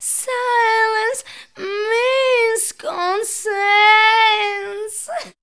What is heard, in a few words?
SILENCE; Best Service; Voice Spectral II